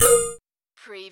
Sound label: Servo Panel Sound - Access